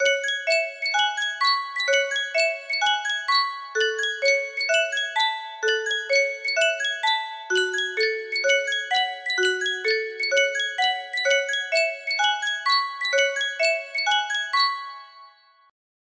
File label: yunsheng music box